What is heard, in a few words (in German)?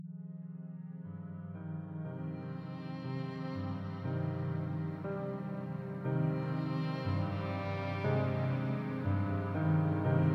BB 20Hz mit Ambient Sound, binaural; Beta; Boost